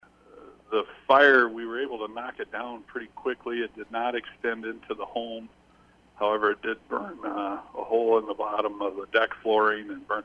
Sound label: deck fire; Schipper